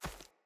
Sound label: Battle Dog Walk Veg, Footsteps